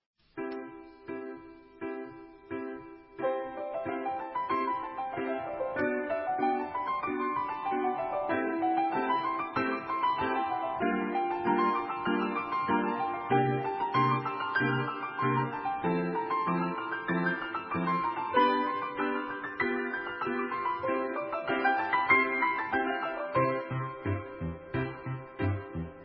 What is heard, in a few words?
scale